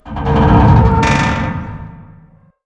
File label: bin squeak med; hl2 ep2; ambient, levels, outland